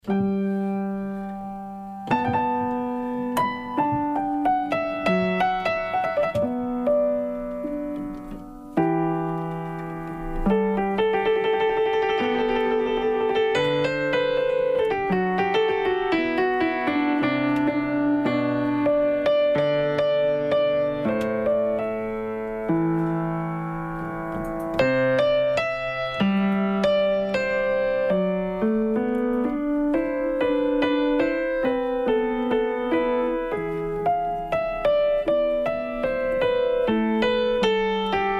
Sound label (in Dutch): improvisatie quasi bach, midi